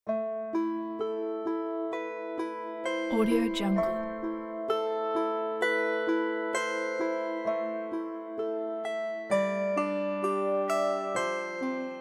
medieval melody

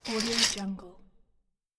old boat bunk bed curtains

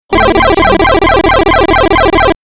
scoring points retro tally

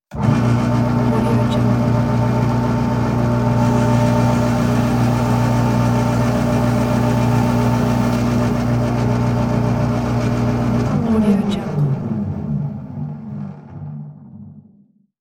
small electric paper shredder motor; sound-ideas

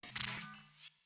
transitional confirms

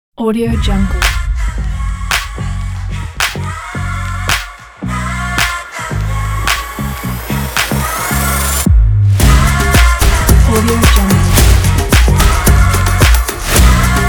happy stomp

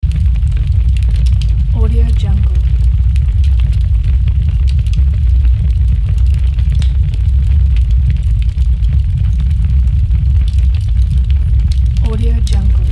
large dark fire

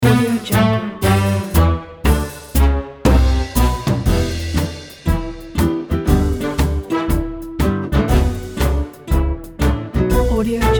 big band fun

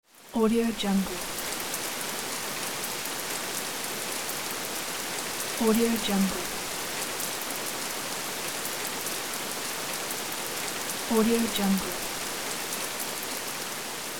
rain shower

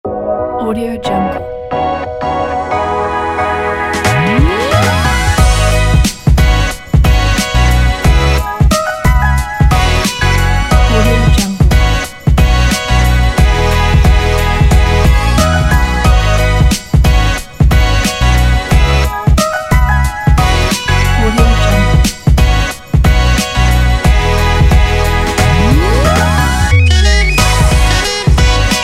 hip hop

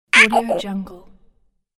cartoon bird screech info

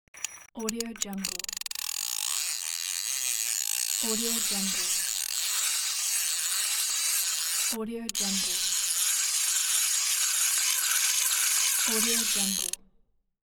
fishing reel

